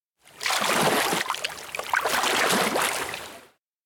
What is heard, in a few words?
climb from sea; aino